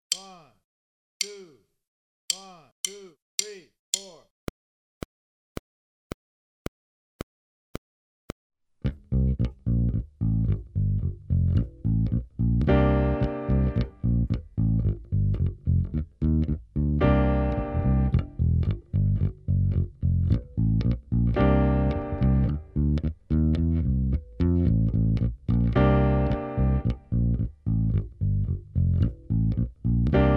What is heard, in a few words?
Pop, Drum Fills; Essential Drum Fills; Erskine